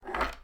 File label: Screwdriver Sound; Don't Be Afraid The First Toy